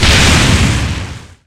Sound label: Enemy Woodblock Active Hit; gamerip